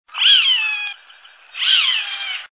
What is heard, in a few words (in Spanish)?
voz chimango